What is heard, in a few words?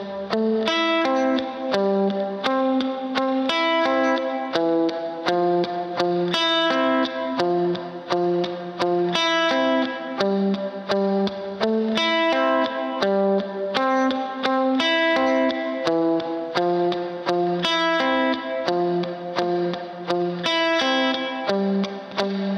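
Pickin guitar, A minor, 85bpm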